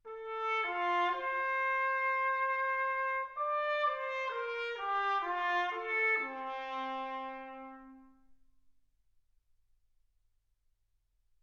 trumpet

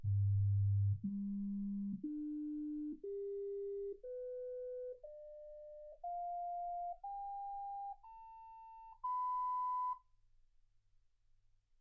sinus tones 48kHz

